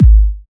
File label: low kick